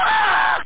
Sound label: aagh; Doom